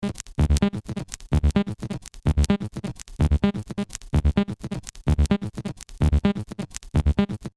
128 lectric vynil; Loop Mania Sampler Pack

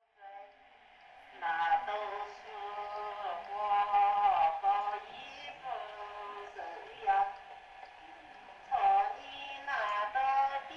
Temple loudspeaker, CHINA; Les Cartes Postales Sonores; NOISE ON EARTH Vol6

